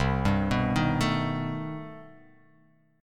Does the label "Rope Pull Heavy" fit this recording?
No